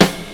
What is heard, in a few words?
2000s smooth acoustic snare sample f key, modern snare drum